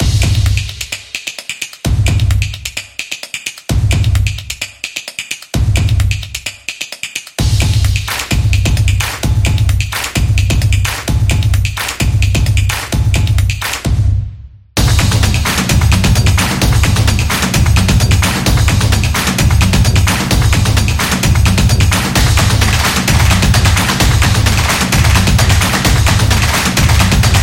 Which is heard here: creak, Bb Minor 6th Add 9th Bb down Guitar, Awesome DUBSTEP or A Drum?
A Drum